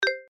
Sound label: auto button; ThreeHeadedDragon